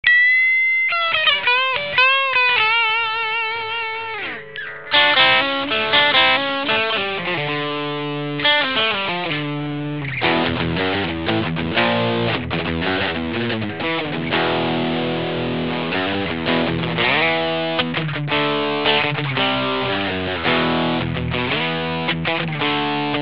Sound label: bluesbreaker